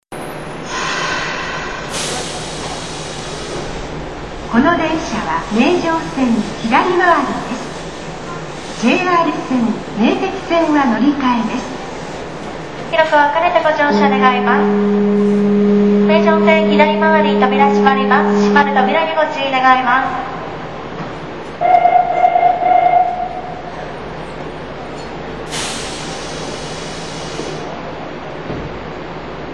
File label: counter-clockwise depart; meijo